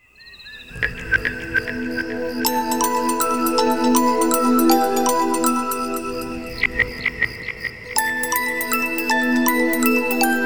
Rise, ringtones; Alcatel OneTouch Pop C1 OT-4015

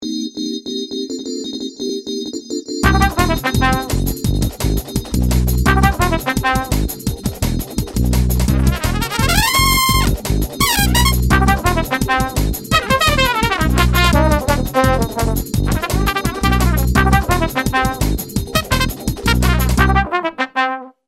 Batucada; LG; Dance in Group

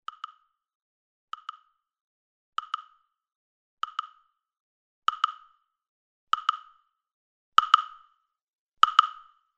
Oak Wake-up Alarm; Samsung SGH-F310 Serenata; Alarms

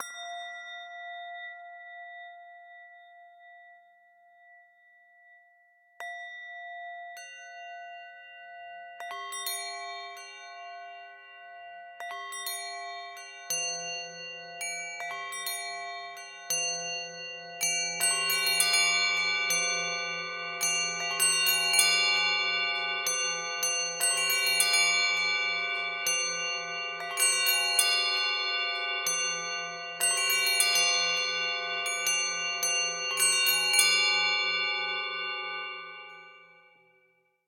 Meditation, alarms; Google Pixel 4; Play It Loud

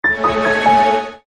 Eerie; Sony Ericsson; PlusFourSix

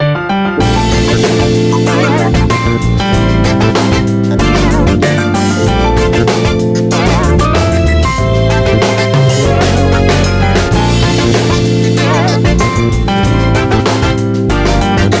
Alarm Easy Morning; Samsung Z2; Ringtones

